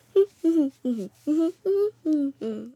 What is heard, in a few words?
Child Singing, Kids; Child B Singing